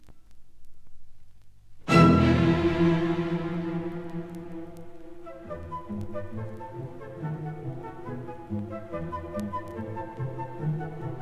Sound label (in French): Petite Suite d'orchestre; Bizet; Jeux d'enfants; Munch